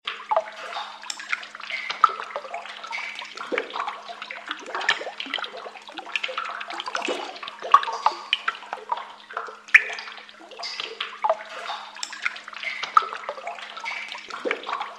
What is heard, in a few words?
Fast Dripping Water